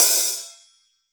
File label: OH1 -L, Drums Acoustic; AKAI; 90s sample CD; USB Soundscan vol.10; RAW KIT 4